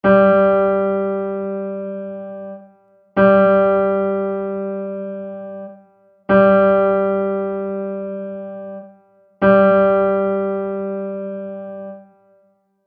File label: Concert G